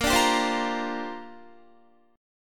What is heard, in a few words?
A sharp 6th Add 9th, Guitar; A#6add9 strummed